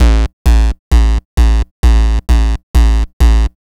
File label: Kick, Trance